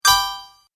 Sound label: Message Alert, beep